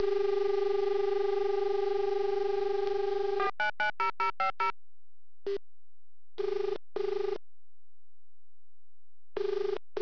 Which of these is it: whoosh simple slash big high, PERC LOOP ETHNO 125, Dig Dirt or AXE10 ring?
AXE10 ring